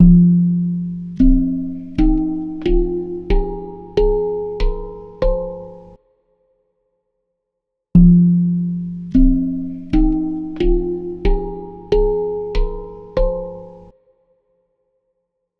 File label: piezo handpan